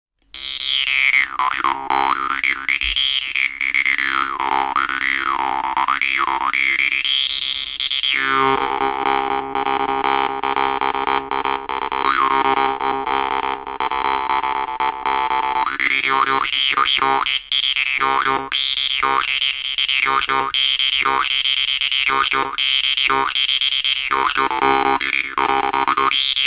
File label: melody jews harps wave